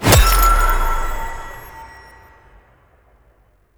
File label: Glass Fragmented Impact; Warframe